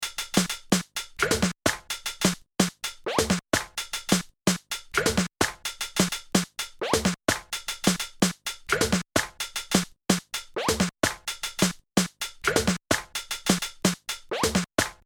128 groovy snare and hi hat; Loop Mania Sampler Pack

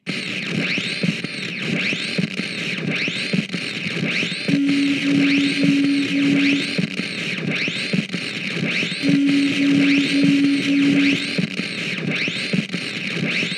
Musique concrete

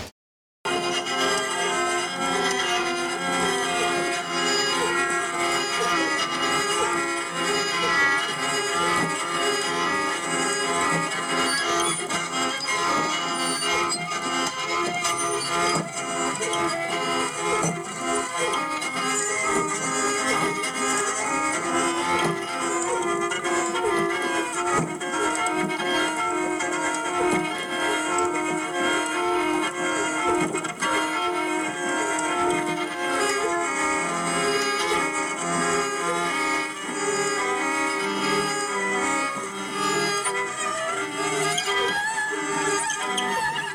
Triple Neck Double Piston Wheeling Violin

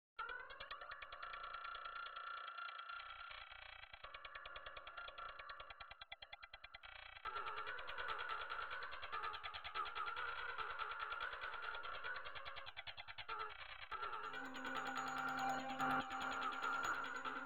Industrial tense 144bpm

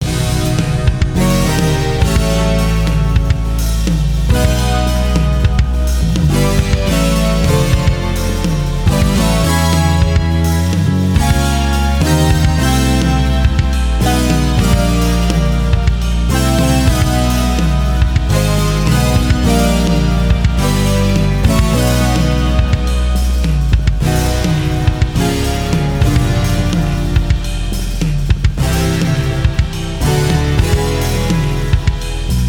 Unusual Unease Odd melody off kilter drums; CUES for film TV games etc

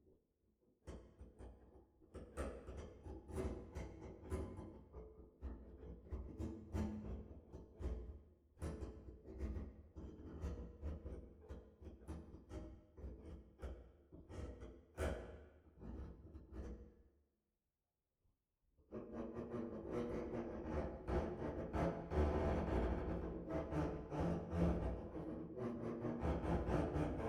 Prepared Double Bass

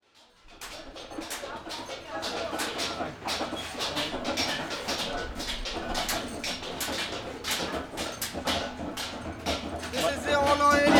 Bowl-Pressing Machine Jodhpur; Environments; Samuel Corwin; Selected Field Recordings from India and Nepal Volume III

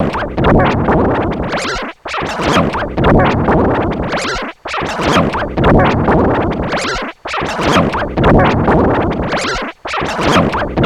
windchime symphony

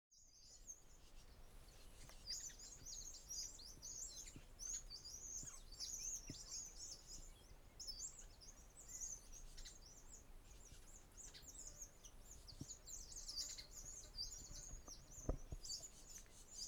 Birds of Bruny island, Australia; Les Cartes Postales Sonores; NOISE ON EARTH Vol7

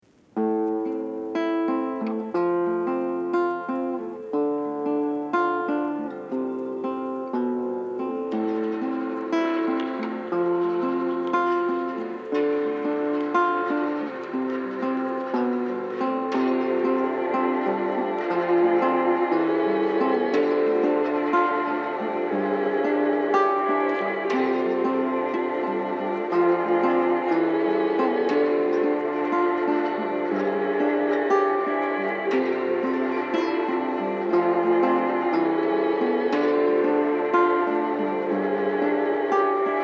quiet and safe instrumental

